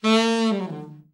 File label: ALT FALL, ALT-SAX FALL; Giga Samples Collection